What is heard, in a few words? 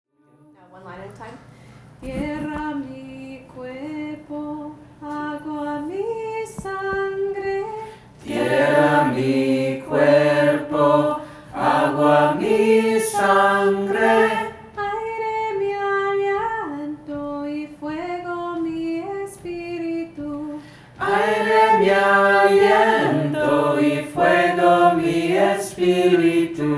Exact Melody; listen especially to “mi aliento” phrasing